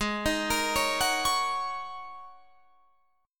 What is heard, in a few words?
Ab 7th Suspended 2nd Suspended 4th, Guitar, Drop D